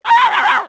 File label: cutey